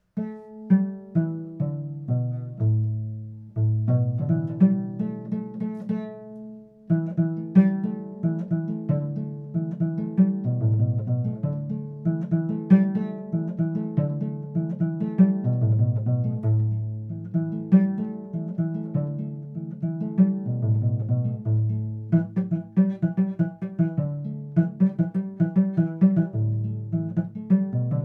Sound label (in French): ngoni six cordes